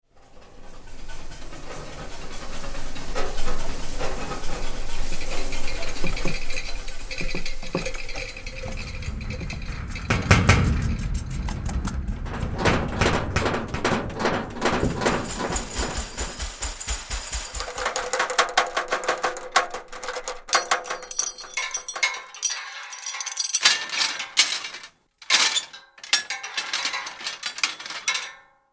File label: metal shakes rattles sound fx; big room sound